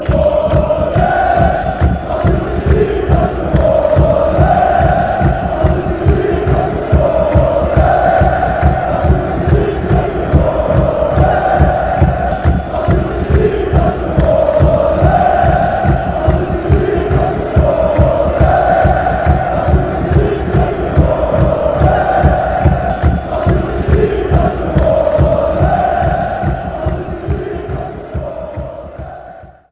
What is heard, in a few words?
ole turkey, fanchants